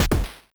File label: hit lowbit slow